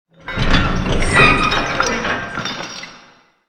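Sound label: big chains sweetener close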